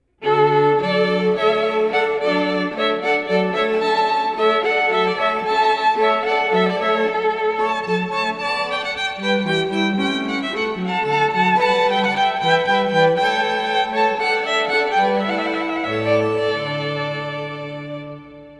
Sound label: Hornpipe